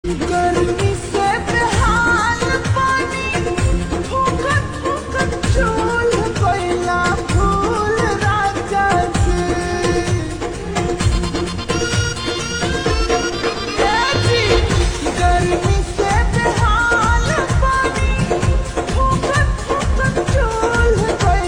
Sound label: Ringtone Slowed Reverb